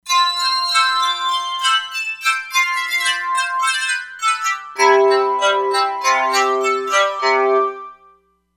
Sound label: Rebab, E-mu Proteus-3; Factory patches; Yesir Rebab